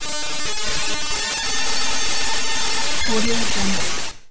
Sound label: computer connection failure advanced digital